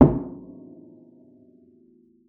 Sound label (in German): KLOPFEN, SPINET; Xsample VOL-7